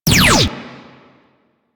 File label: Bronco Prime Fire Shot 2D; Warframe; Weapons